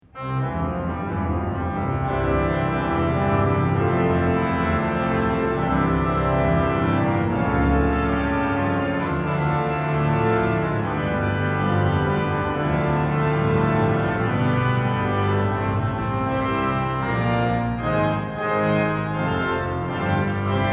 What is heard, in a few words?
Organ Master Works; willis